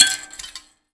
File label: Finger Hit, Prepared Kit; Battery 3 Sample Kits